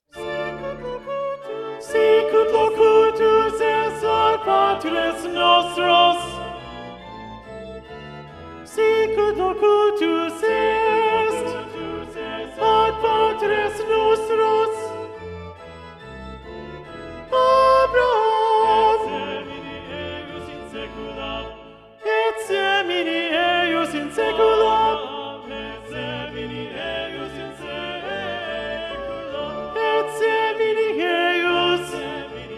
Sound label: Alto Predominant; Durante; Sicut locutus est and Gloria Patri (Magnificat)